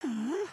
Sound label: curious, emotes